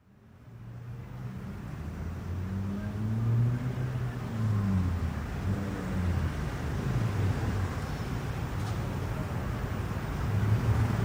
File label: Vocal